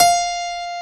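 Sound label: piano attack F5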